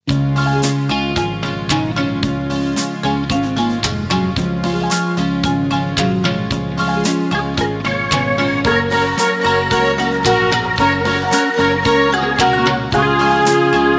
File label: blues roll on